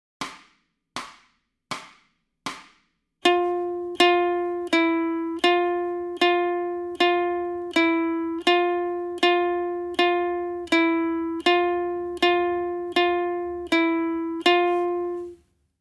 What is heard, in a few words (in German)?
Ton F Warm-up, ukulele